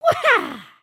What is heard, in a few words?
breakdance wahaa, emotes